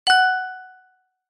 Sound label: Notification sound